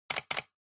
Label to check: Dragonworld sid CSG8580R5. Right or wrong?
Wrong